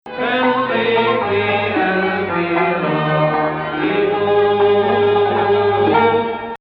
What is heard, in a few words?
Rast Octave, maqam